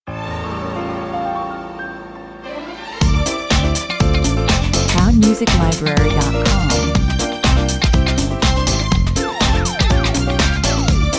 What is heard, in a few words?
disco times 81 bpm